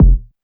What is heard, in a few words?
Kick Ascend